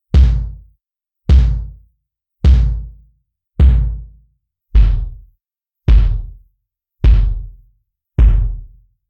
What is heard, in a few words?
Tired Old Bass Drum